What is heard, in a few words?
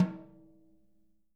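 jazz snares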